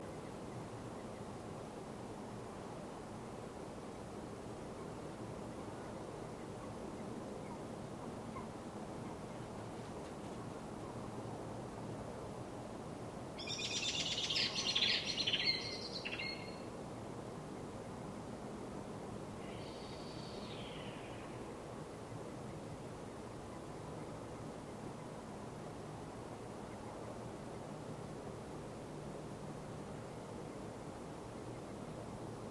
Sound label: Common Blackbird Turdus merula